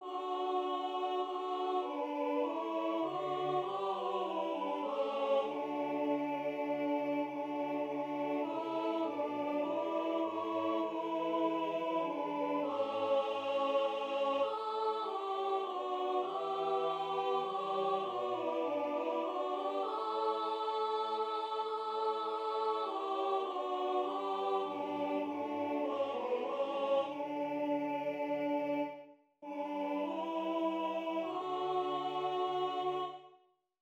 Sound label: Alto Prominent